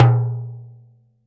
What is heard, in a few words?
L-TIMBA OP, TIMBALES